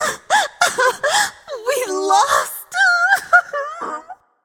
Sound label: boohoo; smite; tiamat